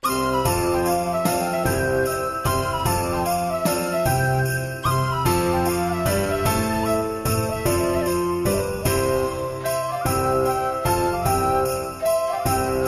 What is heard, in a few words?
Christmas Dance, No Drums